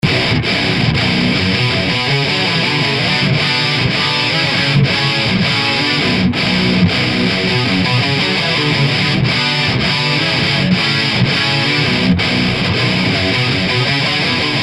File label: drop B stereo krank